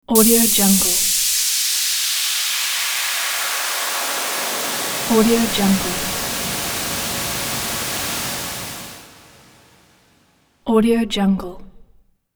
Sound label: pressure release; marburymedia